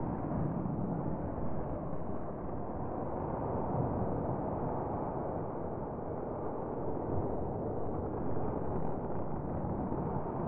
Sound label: hangar wind; War Thunder